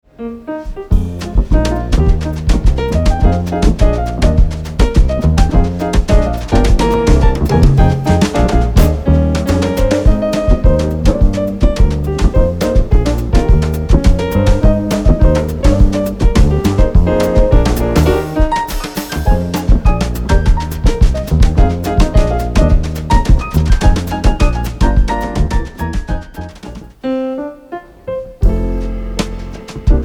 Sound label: modern swing ensemble instrumentales jazz trio